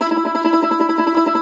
cumbus saz E, plucked strings

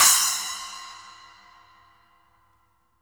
SPLASH B, SPLASH; Sampleheads; New York City Drumworks VOL-1

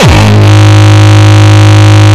Longkick, kicks

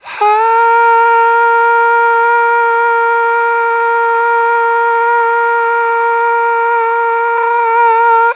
AHHH, Vocal; Polyend

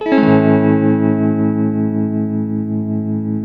TELTMUMAJ A#, TELE CHORDS, Guitars; Spectrasonics; Hans Zimmer Guitars VOL-1

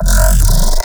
type growl

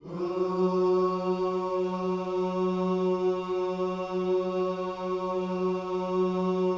OOS F#3, GOSPEL; Spectrasonics; Vocal Planet

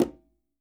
QUINTO LOW; Sampleheads; NYC Percussionworks

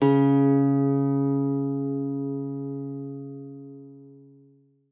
C#3, GUITAR; Xsample VOL-2

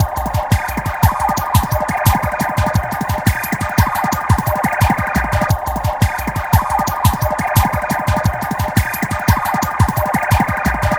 FRACTAL DUB; FRENETIC FRACTAL GROOVES

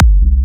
Jump Kicks, Bassdrums

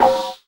FX Dance

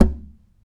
TAP, FLAMENCO Guitars

